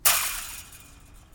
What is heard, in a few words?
wire mesh